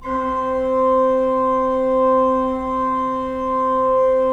3D Organs Pipe Mix Celeste

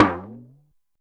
TALK DRUM

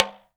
TALK DRM, Heart of Africa; Spectrasonics

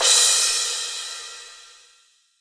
CRASH, HR-16 Drum Mashines; EdgeSounds

